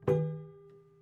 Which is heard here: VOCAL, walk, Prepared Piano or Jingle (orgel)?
Prepared Piano